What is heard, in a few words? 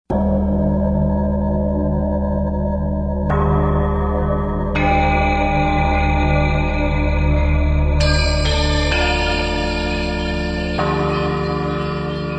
Zen Air Bell, Yamaha TG55; Factory Presets